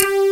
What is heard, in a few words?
plug bass; Pro-Rec - Synthsonic; TRINITY